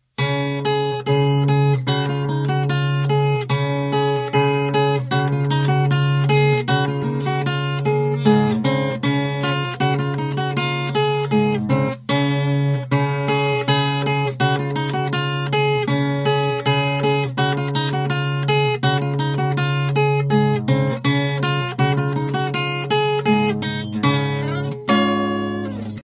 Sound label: guitar